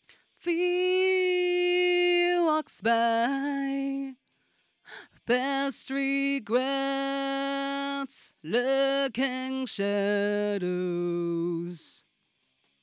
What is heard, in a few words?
Vocal